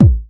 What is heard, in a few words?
good bass drum single hit a key; kick drum samples